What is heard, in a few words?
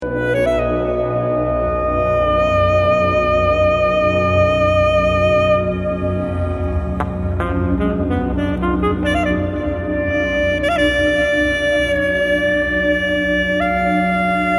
fanfare